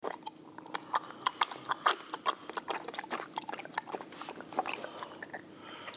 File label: throat, moans; The Witcher Hunt